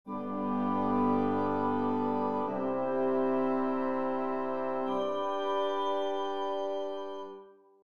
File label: chords; Nikolai Rimsky Korsakov